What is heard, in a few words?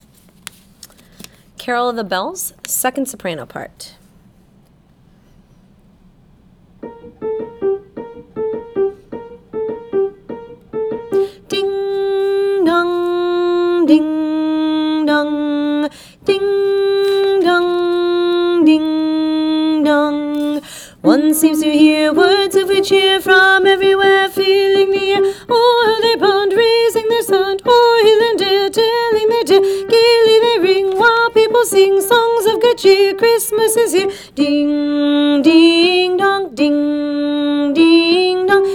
Carol of the Bells